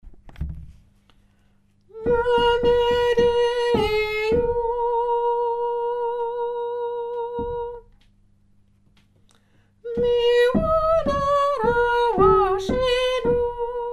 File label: mezzo